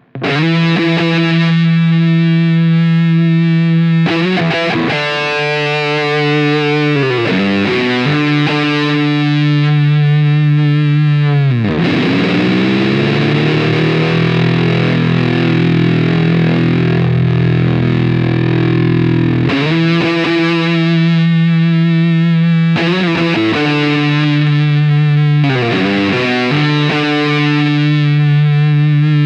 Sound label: Rams Head Driven Amp; J Mascis; Tone 2 Uhr; Sustain 12 Uhr